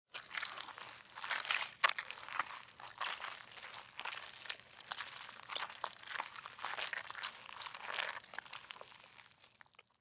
Worm Crawl, Animal, Worm, Crawl, Movement, Slimy, Wet, Long; Insect; Bug; BlastWave